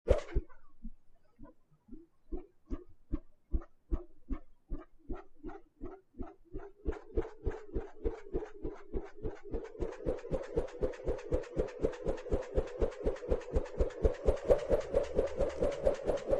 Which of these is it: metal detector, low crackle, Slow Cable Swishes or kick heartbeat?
Slow Cable Swishes